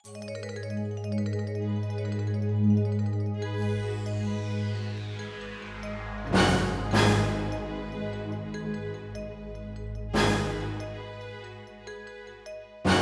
Bonus; ShadowCaster reworked midi soundtrack 2019

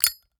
zippo lighter open

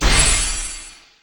achievement completed; Dash Quest; android

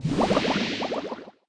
Bubble

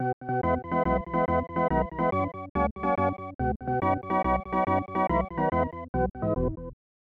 Fanfare Happiness; super bases loaded 3; snes

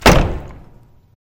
Door Slam; those nights at rachels gamerip 2015

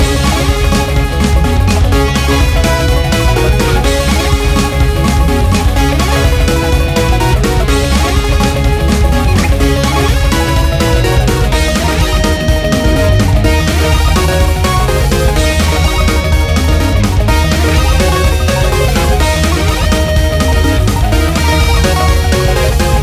Race Boost Loop; Timberman VS; ps4

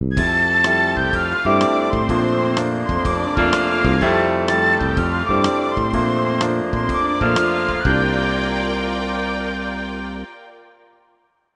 Bari Sax

